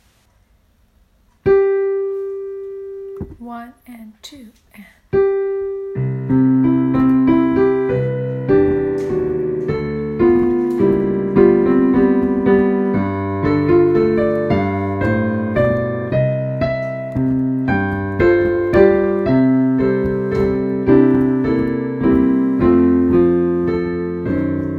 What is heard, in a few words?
Amaryllis Accompaniment